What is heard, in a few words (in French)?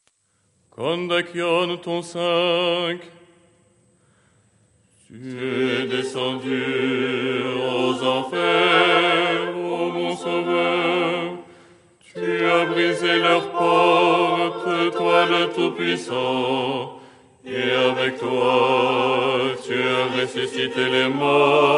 Kondakion ton5 voix basse